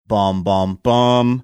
singing bom bom bom

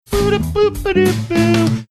singing along

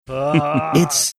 groaning

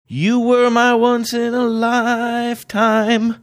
singing you were once in a lifetime

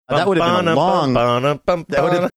singing a song

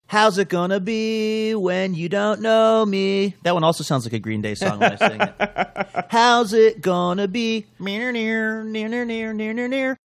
sings

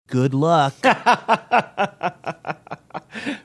laughing good luck

